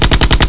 gang gun; Chase Hollywood Stunt Driver; XBOX

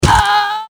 die; Soldier Of Fortune II Double Helix; XBOX

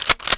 Shot Gun Cock

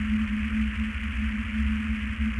ambient; fuusoku

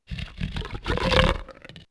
Cerato Roar, Dino

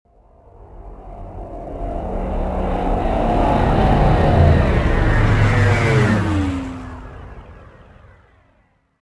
traffic by; movers objects

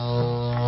tinny

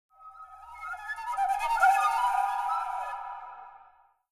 MUSIC pipe; Myst III Exile

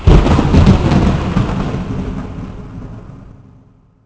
Thunder; The Elder Scrolls III Morrowind GOTY; XBOX

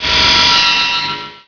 sloppy landing, skater; Tony Hawk's Project 8; XBOX

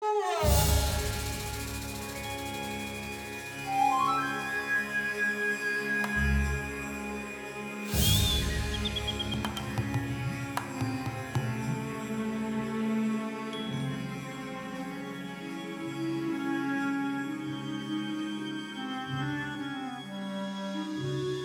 Ceylon Amb; music; XBOX

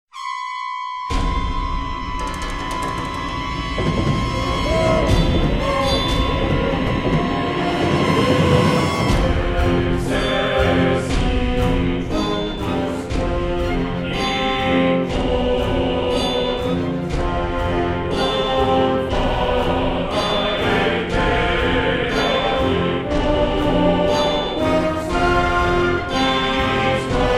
WIN; ArmedAndDangerous; Wrath

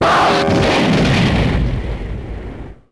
explo springy; impacts explos

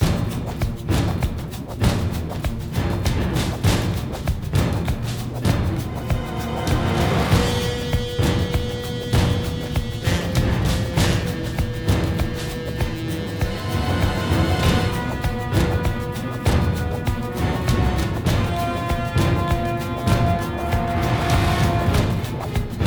fight; Godzilla Save The Earth; XBOX

